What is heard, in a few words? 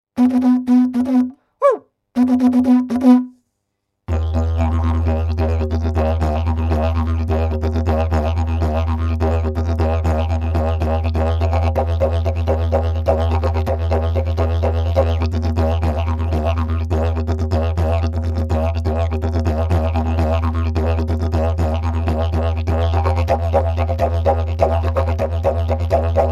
didjbone wobble e